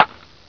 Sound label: home run